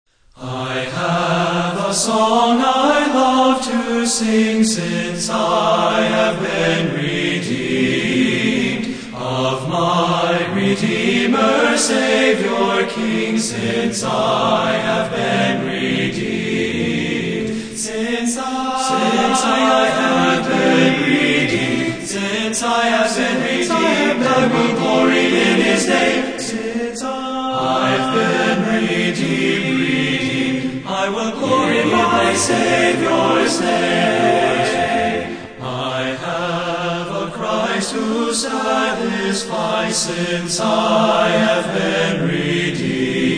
Singers And Orchestra

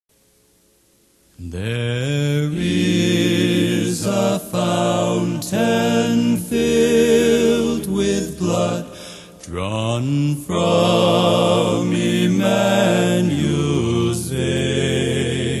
Old Hymns A Capella

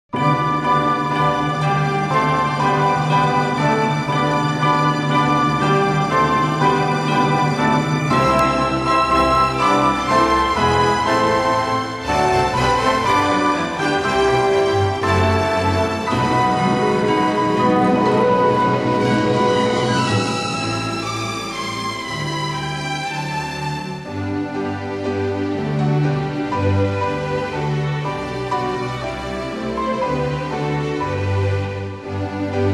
Christmas Meditations for Orchestra

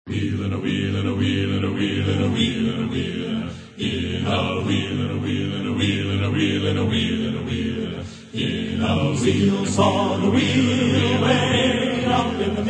Men's Choir; Ezekiel Saw The Wheel; Get On Board

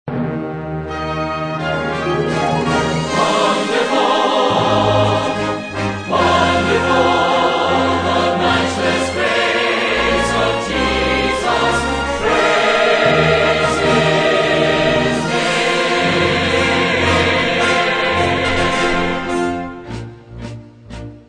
Singers With Orchestra

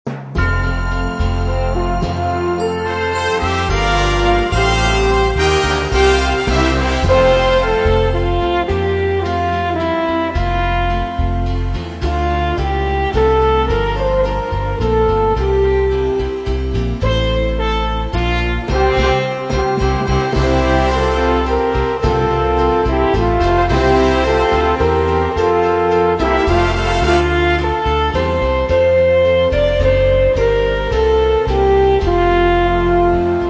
Instrumental Hymns

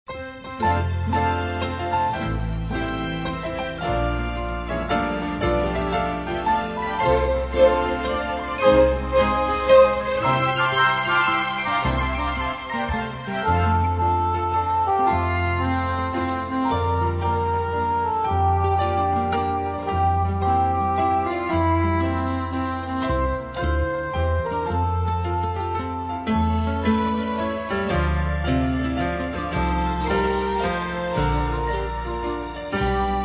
Orchestra, Strings; Majesty Orchestra

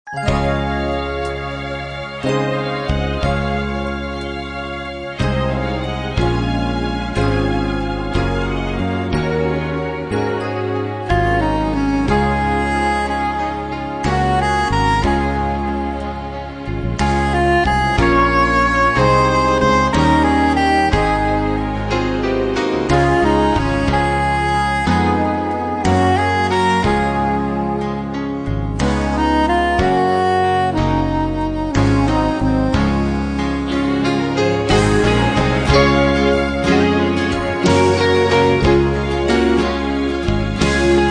Instrumental Hymns

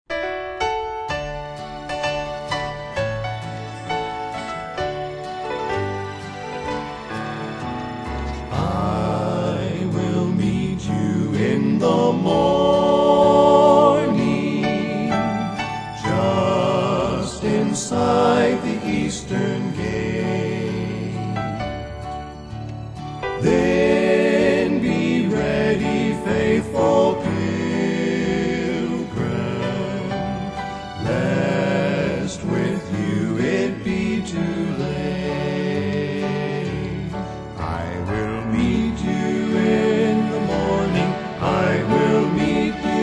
trio

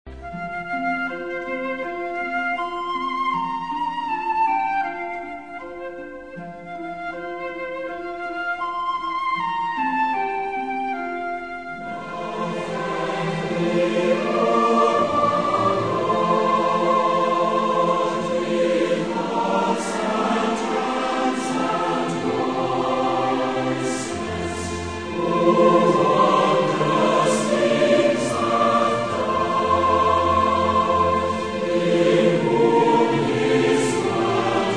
Orchestra and Choir, Hymns

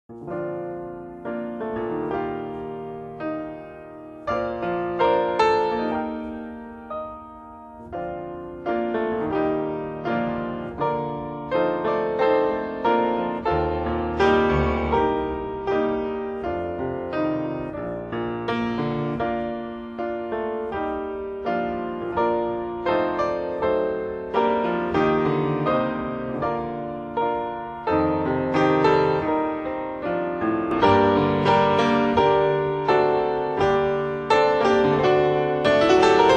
piano solos; love medley

